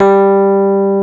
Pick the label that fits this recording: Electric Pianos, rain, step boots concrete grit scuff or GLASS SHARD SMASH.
Electric Pianos